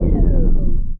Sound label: grave downgrade; Sid Meier's Civilization 4 Complete; Beyond the Sword; Units